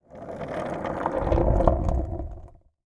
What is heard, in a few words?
Skateboard Roll On Cement Short; Movements; Sports Movements